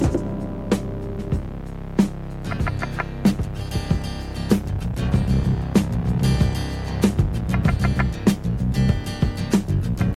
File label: 95 bpm 70s pop drum groove c key, Free drum groove - kick tuned to the C note